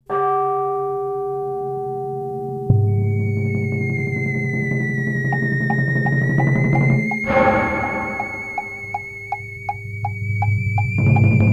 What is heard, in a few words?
BGM Horror; ps3 gamerip 2009; Toro to Morimori